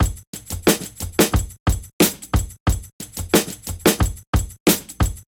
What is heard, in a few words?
Kit, Drums; Suicide